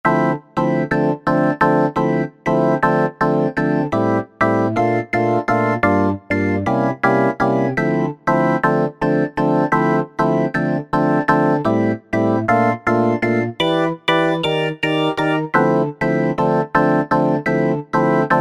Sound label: Disco